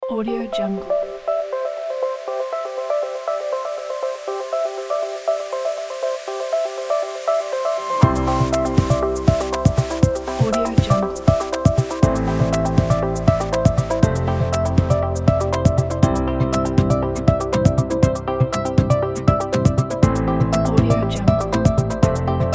inspirational technology pack

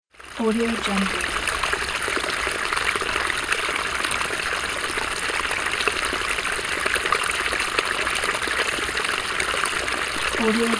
water

